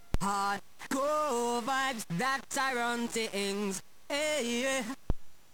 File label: sbpro2 opl3; 11mhz